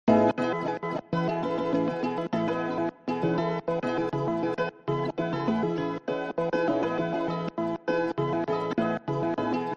chopped guitar

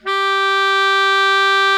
baritone mf G 3, saxophones; Greytsounds - Philip Wolfe David Crozier's Saxophones

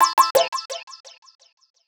D&B Synth Riff; Keys; neuro

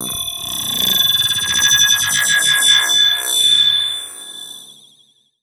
Lazery Metal

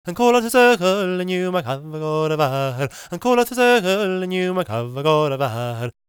GAELIC, World Voices; ILIO; Vocal Planet

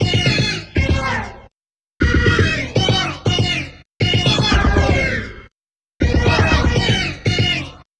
Guitar Based; Eclectic Electronica

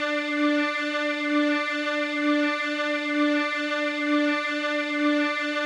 Kawai K1 string loop; wavetables

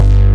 S FUZZ BASS; Pro-Rec - Synthsonic; BASSES

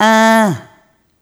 Rhythmic A

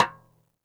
xstick - snares off; JazzFunkKit

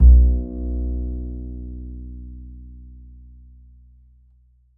E, Upright Acoustic Bass; Larry Seyer; SWITCHABLE POSITIONS

